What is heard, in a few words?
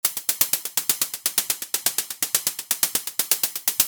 Hi hats Loops